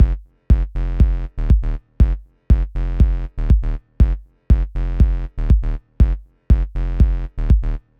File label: drumloop